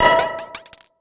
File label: digi pizz; analogBully; Ableton Foundation FreePacks; Wicked Kits